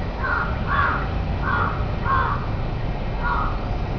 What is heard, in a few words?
corvus macrorhynchos; srilanka